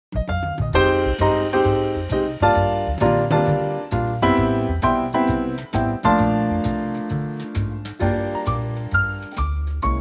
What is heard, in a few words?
Eb trio